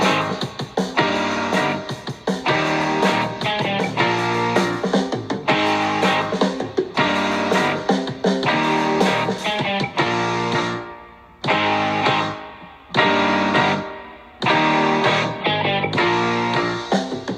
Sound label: Drums